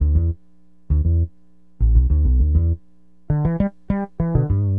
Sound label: BASS, Acid Jazz Funk